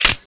slide release

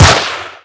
mp5, weapons

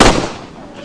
fire

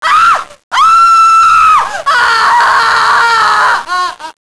female fire death

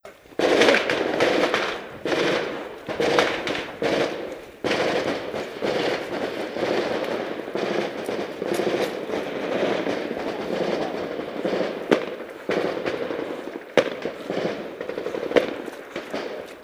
distant guns

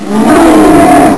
minos die

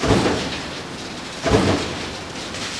machine puncher

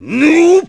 pain

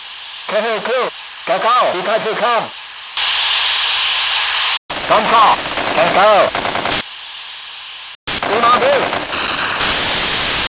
radio

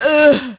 zombie female pain

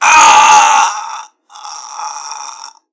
death; doctor

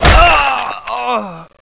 headshot

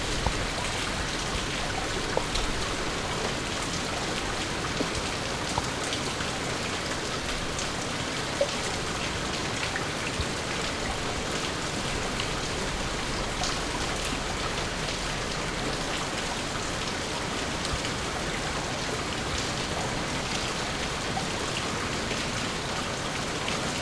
rain in streets

